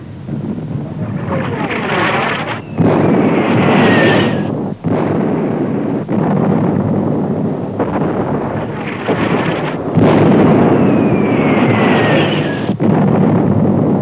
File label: ground; fx; warbirds